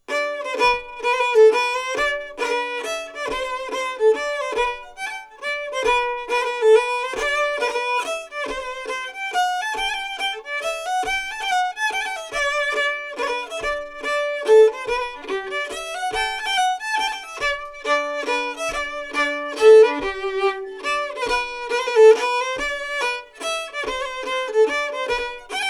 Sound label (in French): Mazurka; Mazurka de Boyer 2 Pauliac